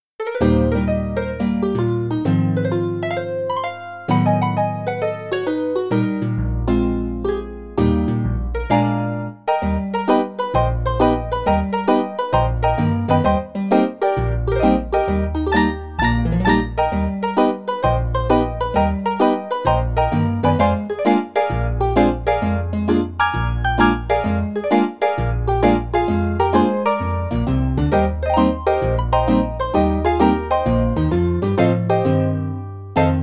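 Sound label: GUSLI soft sound; Raspin MIDI Rollscans; GUSLI myagky zvuk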